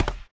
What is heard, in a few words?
step stone, footsteps